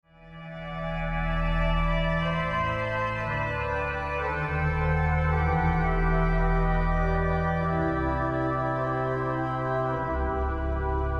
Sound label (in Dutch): orgelkoralen o heer gij zijt weldadig ps